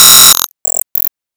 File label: Enemy Dress Common Active Attack Shot